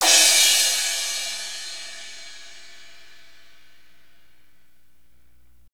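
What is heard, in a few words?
cymbal crash, fast shuffle, Roland; Northstar; Drumscapes Roland; Cymbals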